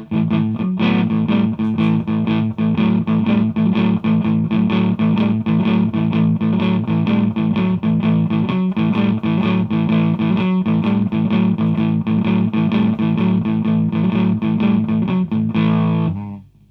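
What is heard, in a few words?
ge200 twin reverb riff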